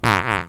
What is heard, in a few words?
fart